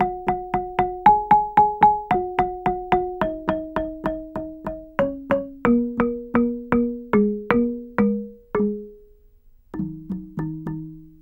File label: GAMBANG PH; Spectrasonics; Heart of Asia